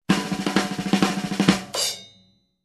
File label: Snare roll drum fill